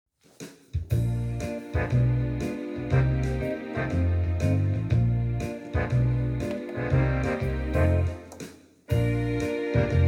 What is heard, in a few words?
schottische